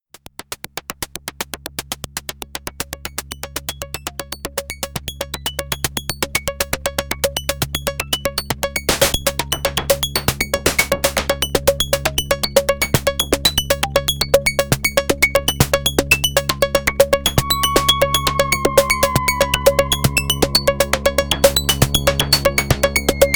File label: Sequential Prophet5 FX